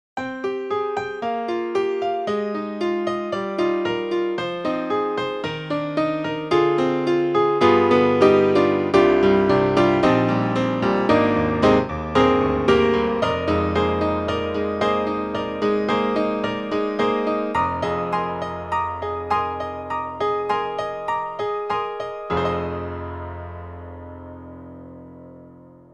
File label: Acoustic Grand; IK Multimedia; SampleTank2.5XL